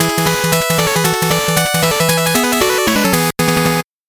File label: 8bit jingle fast